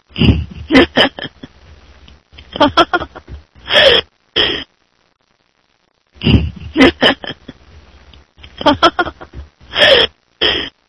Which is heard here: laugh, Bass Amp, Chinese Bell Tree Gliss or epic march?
laugh